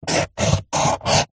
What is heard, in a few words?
office pencil paper hollow table draw square; zapsplat